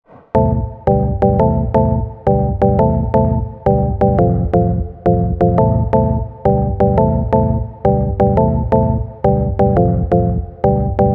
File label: 172, A, Organ Chords